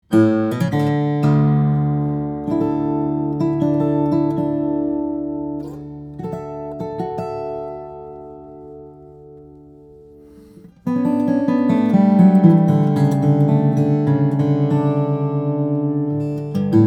Ryan Signature Nightingale, steel string guitars, flattop; brazilian rosewood cedar